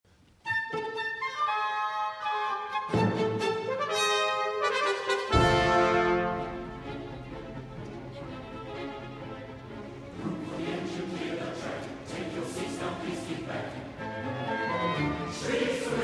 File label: southern marches